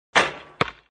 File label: hit